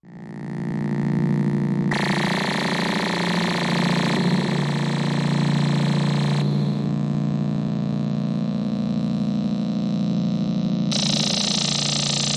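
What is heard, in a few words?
Plasmas Machine Low Electric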